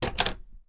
door open; svencoop

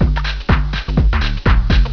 beat; svencoop; votemap

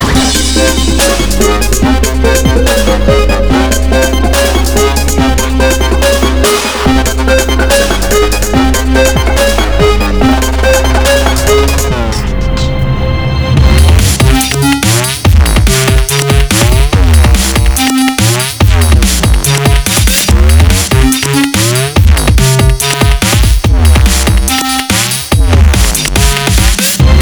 music; tfc; pizza

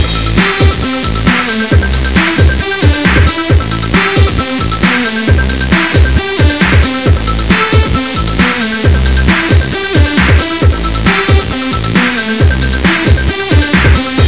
punk; svencoop; barnacleflight

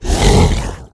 pain

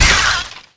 houndeye die; gearbox

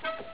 door move; svencoop; aomsounds

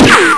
ricochet; firearms

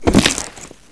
pl fall pain, player

